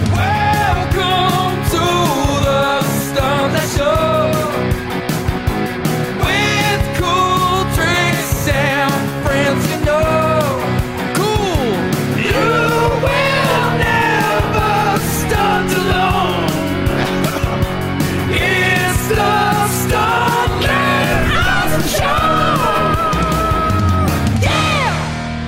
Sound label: piano stunt